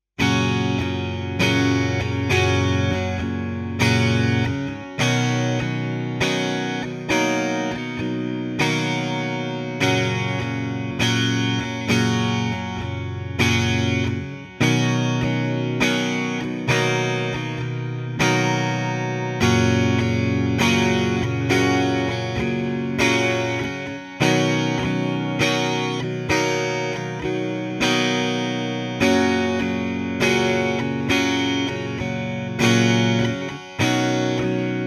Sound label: Chords Single Coil